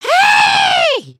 double wave, emotes

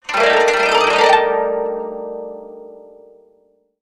Music Strum; Dragon In A Box; destructibles

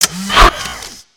Robot Attack Melee; creatures mechanical; Lego

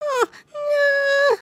cringe; emotes

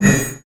Click layer

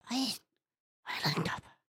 indignant, emotes

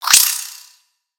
Spider Attack Melee; Lego; creatures mechanical

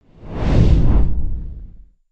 Last Whoosh

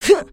Male Grunt; dialog

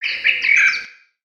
Peaceful Bird One shot

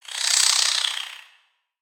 Spider Sleep; creatures mechanical; Lego

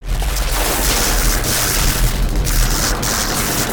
Elect Death

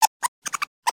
Pets Skunk Beg; creatures; Lego

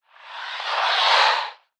Ice Oneshot; Spinjitsu

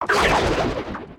mech cam bot hit; creatures mechanical

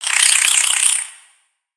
Spider Dazed; creatures mechanical; Lego

